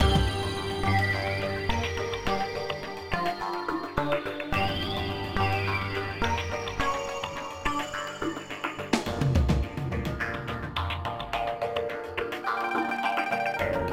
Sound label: Stealth LOOP, soundtracks